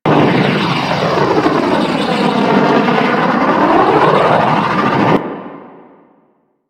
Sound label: Gas City Rushing Gas Loop; Ambience; Props; Warframe; Corpus Gas City; Props Remaster